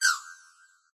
negative game stab sound arcade casino kids mobile app